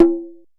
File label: CONGA HI; Univers Sons; Basicussions